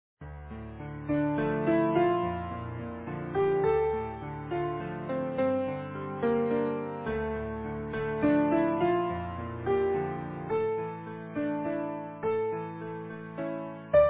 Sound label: Easy Piano